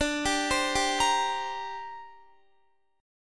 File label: D 7th Suspended 4th Sharp 5th D Guitar; arpeggiated; x,17,17,17,19,17; 4E,4A,5D,5G,5C,6F; chord